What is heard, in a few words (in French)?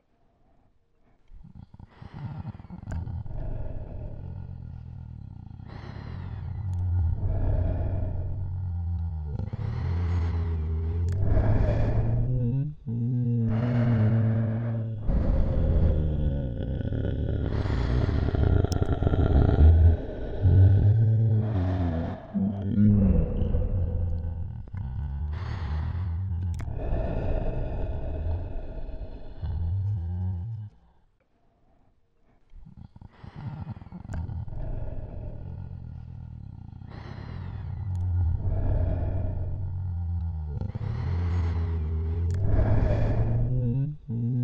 Ambiances